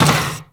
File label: Moa Combined Step Default; Warframe; Footsteps